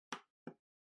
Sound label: Trackpad Click Far L